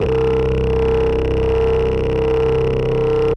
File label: Synth Prophet Bass C; Electronisounds